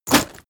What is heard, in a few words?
metal plate armor movement fast